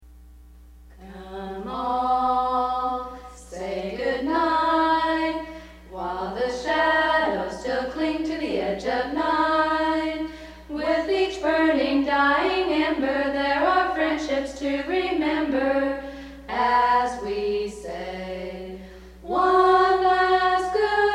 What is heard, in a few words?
Canadian taps; USA